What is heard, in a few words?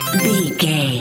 collect item jingle